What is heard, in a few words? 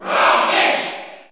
Cheer French NTSC; Robin; SSBU